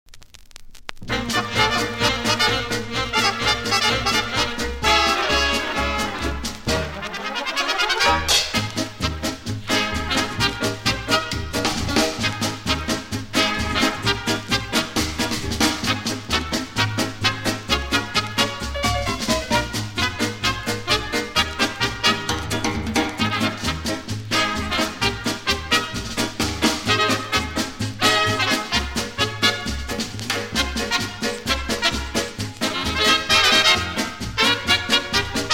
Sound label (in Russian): Звуки джаза; Музыка 30-х